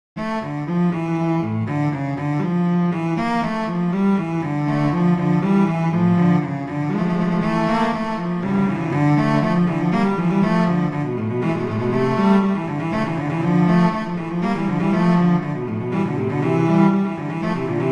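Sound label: Vivace moderato, violin viola; Telemann; Canonic; Sonata 4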